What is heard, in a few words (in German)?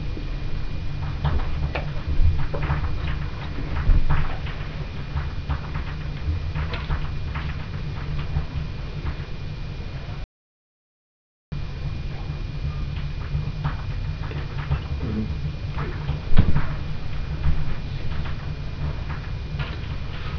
schnalzen, jugend; Muntigl